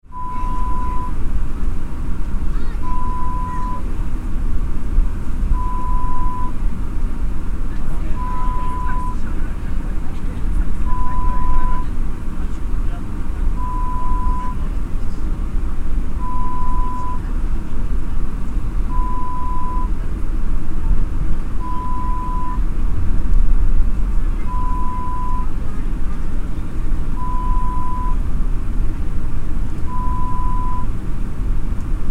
Jet taxiing internal sound

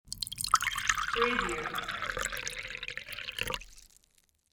pouring liquid

